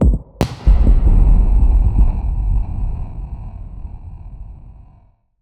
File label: explosion 8k